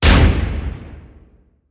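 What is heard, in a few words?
Hit Organic Body Slam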